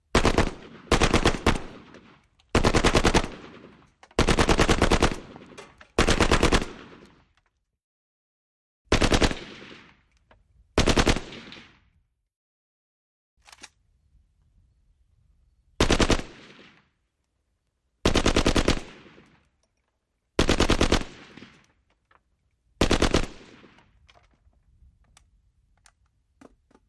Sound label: AK47 Long Bursts Dual